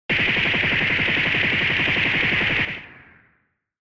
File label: Retro Anime Gun Long